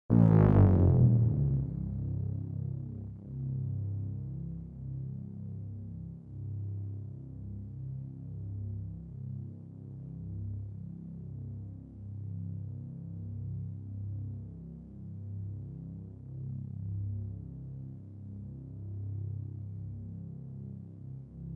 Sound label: Beeoooowwwwww